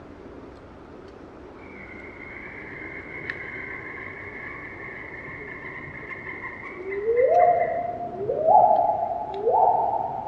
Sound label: Whoops